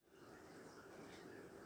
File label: Marimba Remix